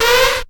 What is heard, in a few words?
ALARM; SoundFactory; SMPMANIA; EFFECTS